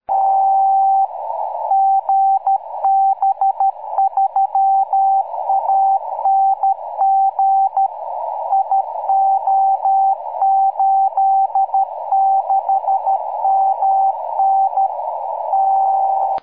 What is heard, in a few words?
beacon, 4m; GB3ANG